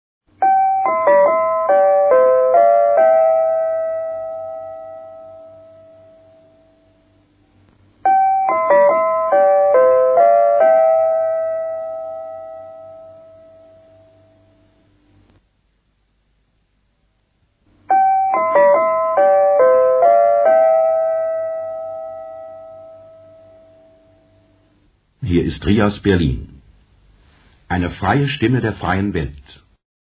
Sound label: interval signal; rias c1948